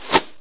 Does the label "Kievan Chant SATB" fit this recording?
No